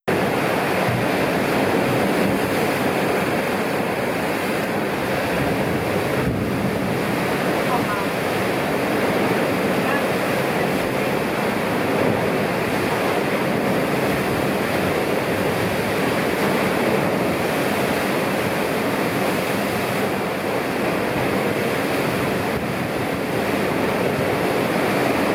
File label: Shete Boka Nationalpark; Curacao; Original Video Ton; Fieldrecordings